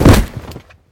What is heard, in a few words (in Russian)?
brosok kopya v skalu